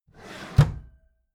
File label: empty drawer smooth open heavy